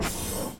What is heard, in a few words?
dropoff airlock open, mono; dokobots